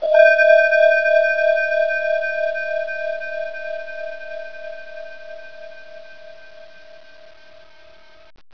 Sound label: mistery